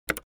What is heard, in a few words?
Optical keyboard keystroke pressing single key